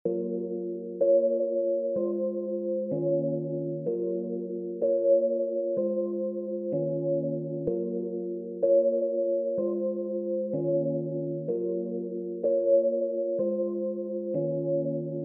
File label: A min 126 BPM Keys